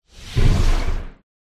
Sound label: Water Spirit